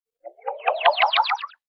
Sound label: Heli wash up